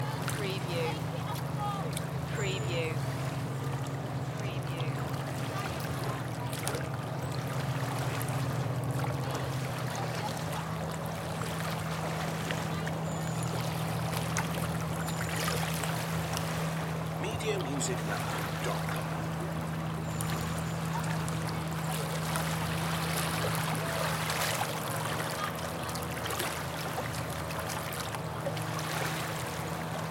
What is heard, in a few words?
Windermere lakeside; beanstalk audio